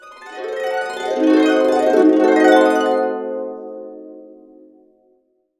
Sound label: C DIM7 UP DN, Harps